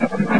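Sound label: scuttle; duke nukem total meltdown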